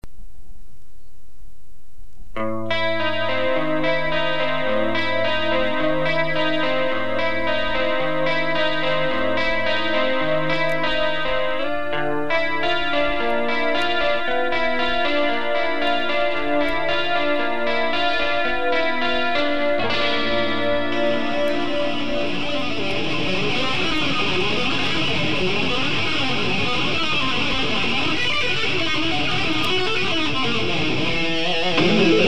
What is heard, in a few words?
Guitar Solo